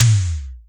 202 tom, Drums, Boss DR-202

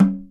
SNR TOM; Greytsounds VOL-1

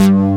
FFAT BS, BASSES, Synthsonic; Pro-Rec - Synthsonic

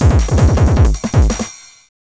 TH 909 160, Hard & Loud Techno; USB Soundscan vol.01; AKAI

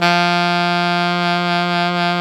SAX ALTO MF

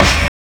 lofi mass; Spectrasonic Distorted Reality 1; RAVELAND RYE

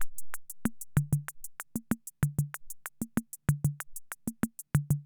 Ace Tone Rhythm Ace Loop, Drum Machines; 1967

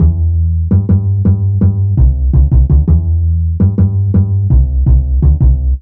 Upright bass loop 165bpm A2, Drum&Bass; Total Drum&Bass

